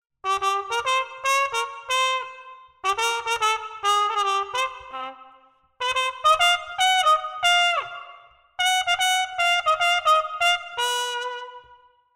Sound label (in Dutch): Cup mute trompet